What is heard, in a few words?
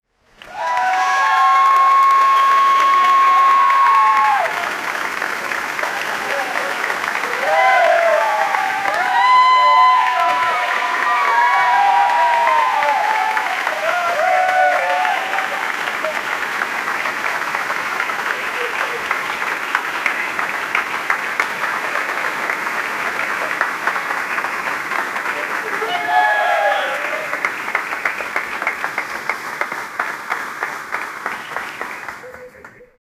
applause and cheers with; outdoors